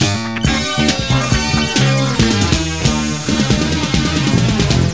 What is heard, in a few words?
Funk; OS/2 desktop